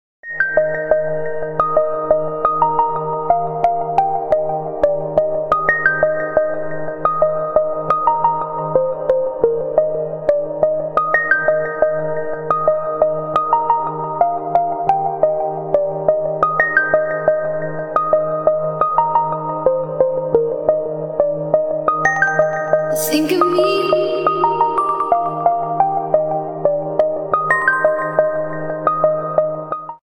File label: Slow Down